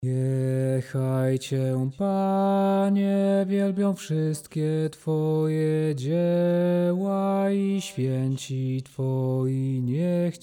Psalm 145 bas

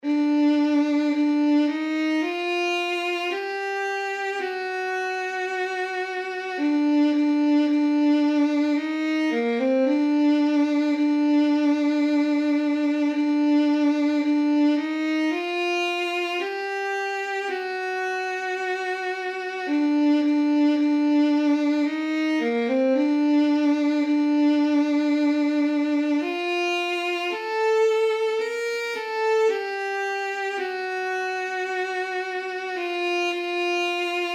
alt midi; Boska dobroci

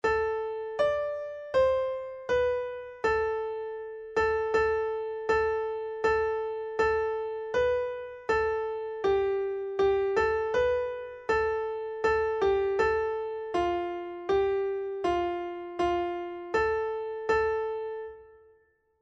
hejnał tenor midi

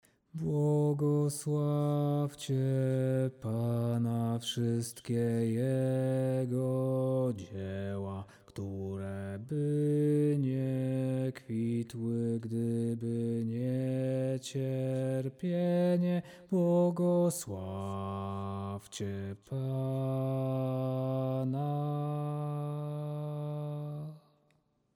Błogosławcie Pana bas

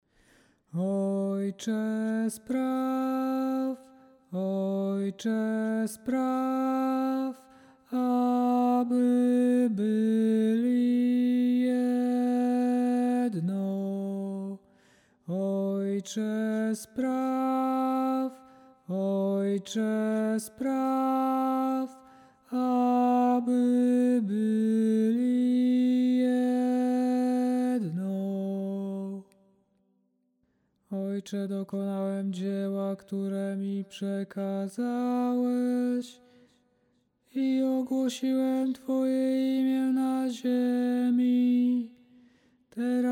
Ojcze spraw tenor

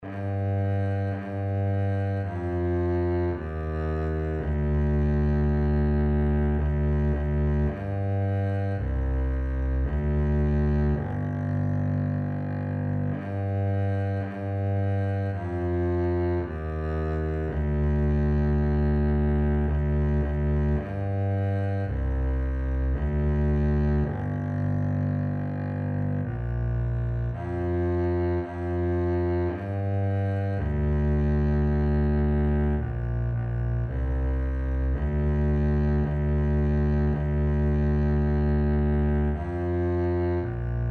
bas midi; Boska dobroci

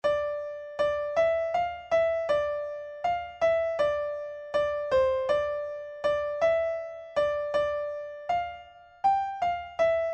hejnał alt midi

